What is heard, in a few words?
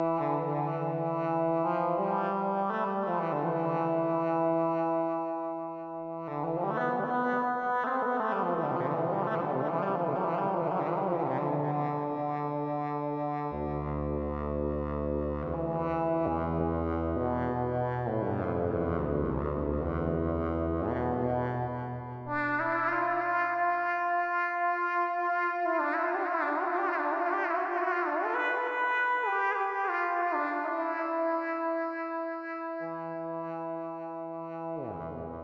EWI Solo Stereo Filters